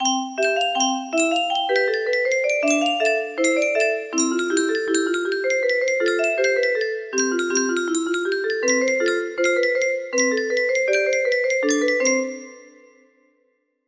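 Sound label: canon, music box melody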